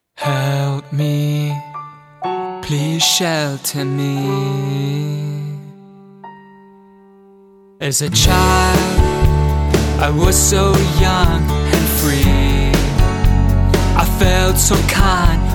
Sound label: intro verse 1 and chorus 1